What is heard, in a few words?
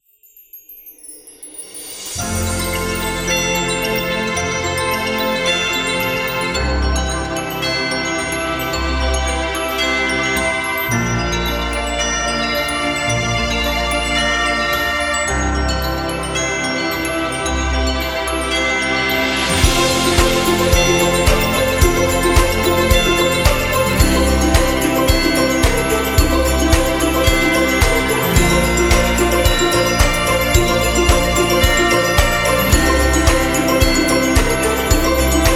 Christmas Background